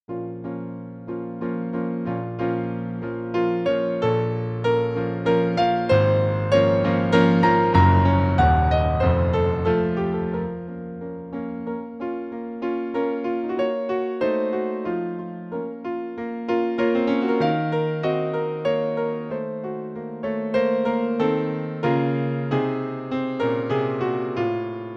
Piano

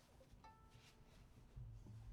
Viola C

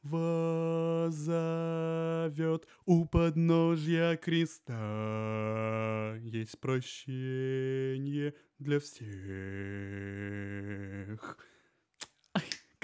bass

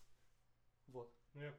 vocal add condens

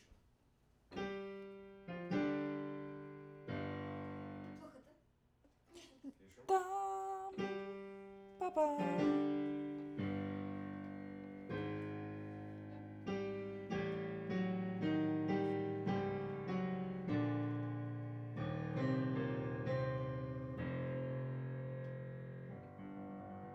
Viola C

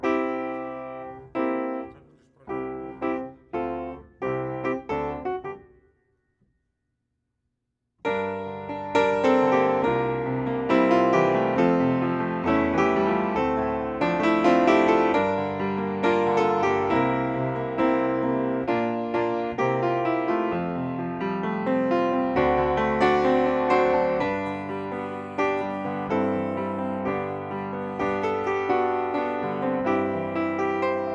piano

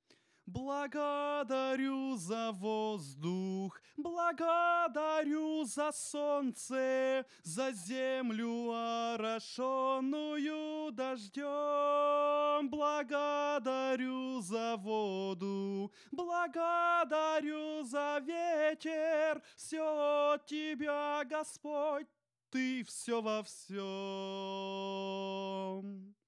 alto dyn

